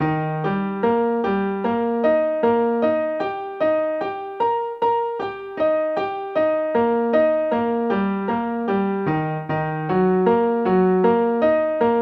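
triad and inversion broken chord triplet in E flat and E flat minor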